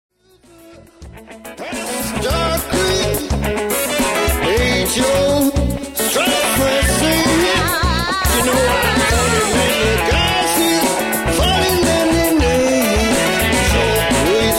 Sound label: solo